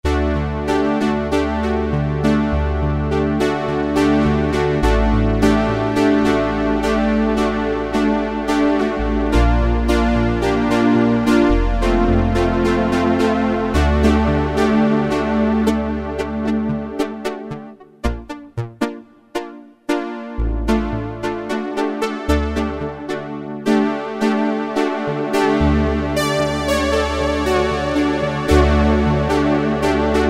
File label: WARM OLD SAW